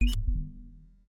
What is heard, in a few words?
fly in